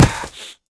fall pain

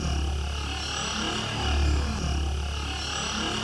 ak47 buff idle

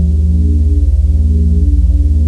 m4a1 idle, weapons

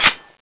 mk3a1 clip out, weapons

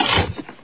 paper

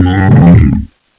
heavy hurt, zombie; Zombie Darkness

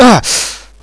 pain; hostage

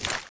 clip in

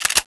bolt pull, weapons

